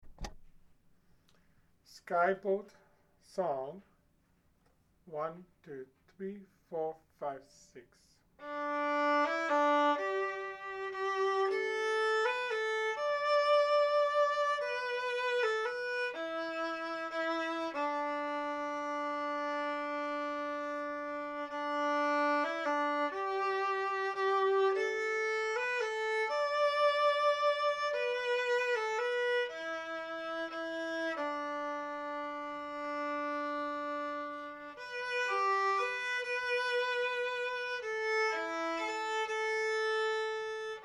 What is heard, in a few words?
Skye Boat, fiddle